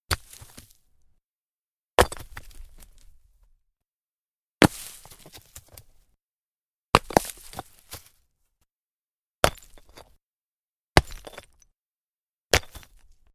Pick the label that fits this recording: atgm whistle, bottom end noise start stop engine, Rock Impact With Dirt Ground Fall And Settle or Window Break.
Rock Impact With Dirt Ground Fall And Settle